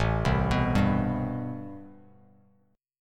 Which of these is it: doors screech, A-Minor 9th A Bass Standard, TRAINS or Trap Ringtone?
A-Minor 9th A Bass Standard